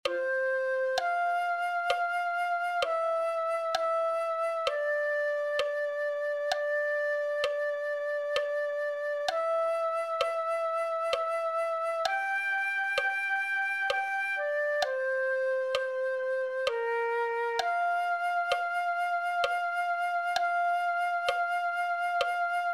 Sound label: flute 1